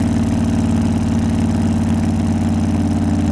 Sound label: Sidecar Walk; Seer; GamigoNA